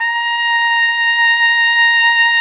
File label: BRS, Piccolo Tpt; Roland L-CDX-03 Disk 2; Picc.Tp 2 M